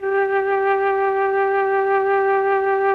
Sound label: MLTRON FLT, MELLOTRN; Sonic Reality; Vintage Timetraveler